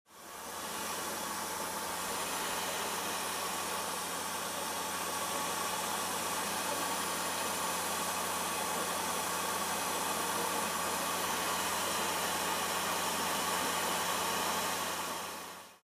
Ignition of a burner on a gas stove; fire

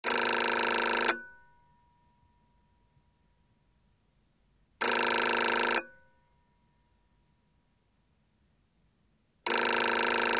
Phone ring

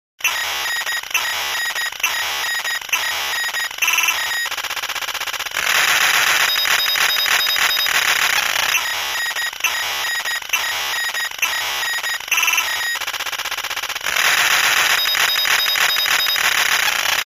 Electronic signal

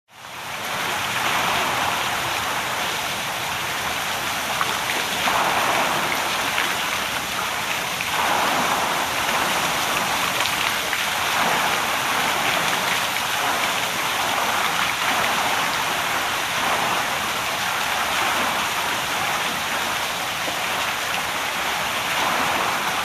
Water turning the wheel of a hydro turbine; river

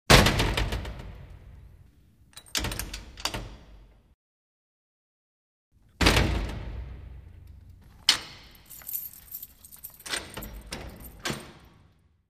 Wooden door slammed shut, locked with a key

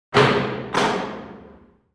metal door closes

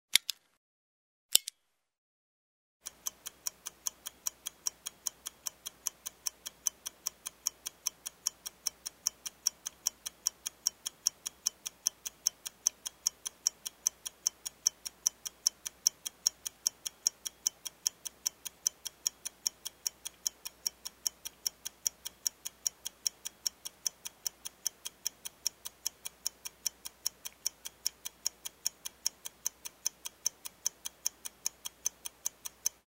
Turn on, tick, stop mechanical stopwatch; watches

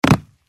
Jump on the wooden floor with shoes; steps

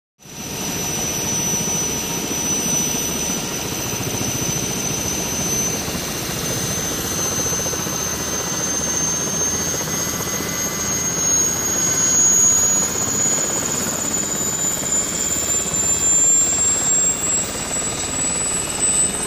Helicopter flies away

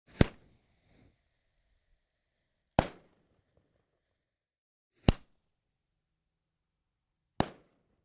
Explosion of a small firecracker; explosions

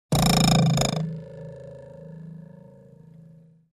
Arrow trembles stuck in a tree; cold weapons

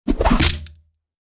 Throwing a knife into a tree; cold weapons

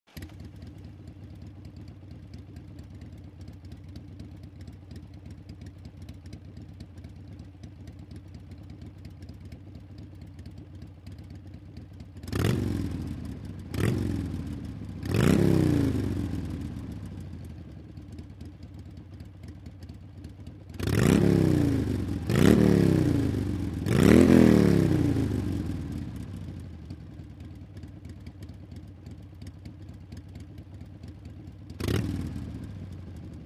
Motorcycle starts, regassing, jamming

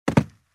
Jump in shoes on a wooden carpeted floor; steps

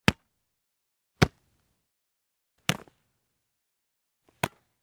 Football, the goalkeeper catches the ball

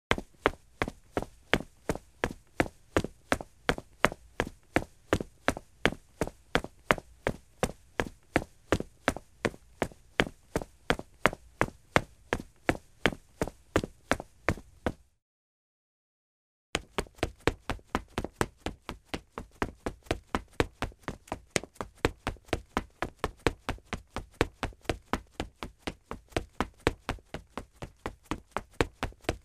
Running on a brick floor in shoes; steps

